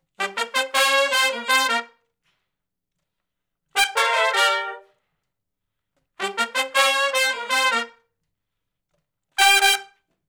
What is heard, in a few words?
R B Riff (Gmin) unison to har, Horncraft; Sections